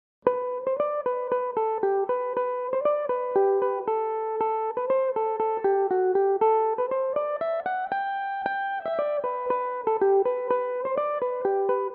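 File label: G major, irish mandolin, tunes; Bridgie Con Matt's Favourite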